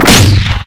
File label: flechette explode, ministrider; npc; source engine; ep2